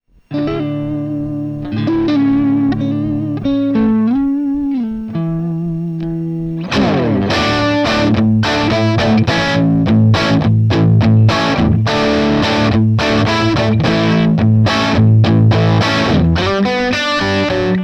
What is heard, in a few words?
jp12-100bb rock; jensentone